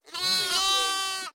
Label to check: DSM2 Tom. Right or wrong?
Wrong